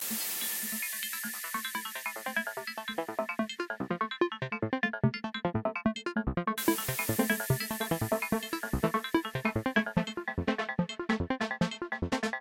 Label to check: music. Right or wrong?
Right